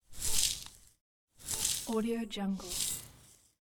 pouring sugar with spoon loop

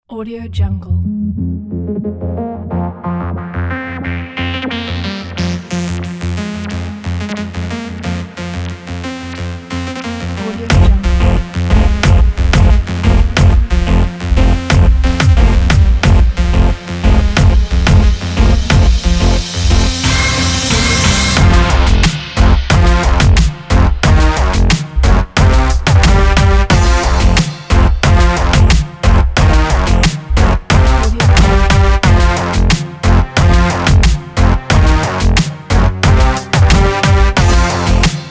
opposition

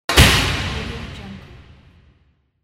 prison door close

